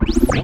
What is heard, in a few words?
Minimal Perc; Minimal Percussion